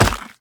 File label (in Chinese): 山羊撞击声